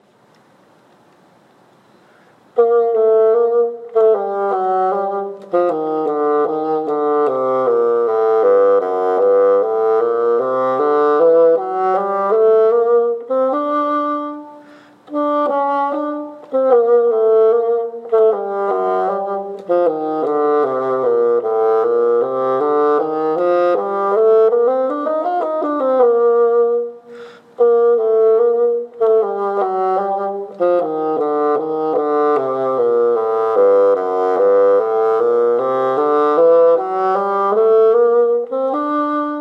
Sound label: Andante G Minor Etude; 8th grade all state